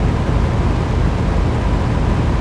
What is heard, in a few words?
houses aircon; Tony Hawk's Project 8; XBOX